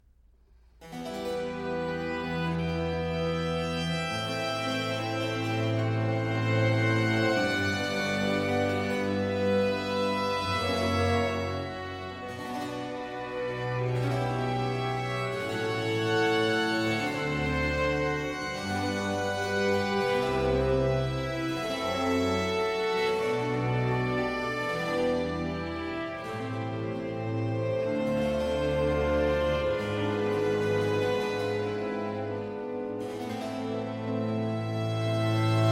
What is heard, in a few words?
Concerto for Strings in F Minor, Adagio; Vivaldi; Concerti per archi; Concerto Italiano